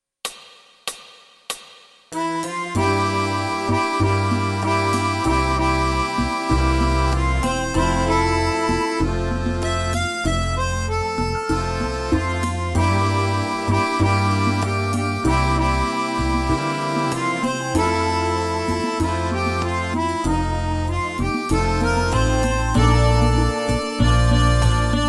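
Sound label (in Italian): Fisarmonica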